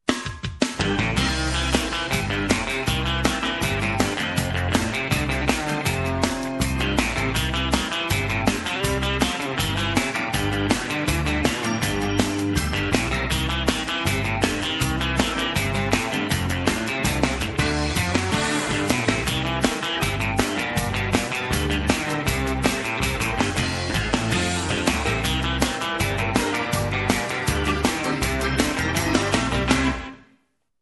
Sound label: Straight Country Rock